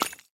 Тап по иконке гемов

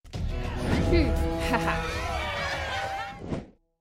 Тап по началу битвы

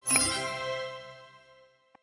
Звук получения награды

